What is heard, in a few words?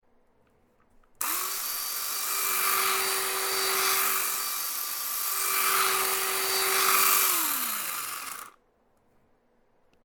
Chainsaw intro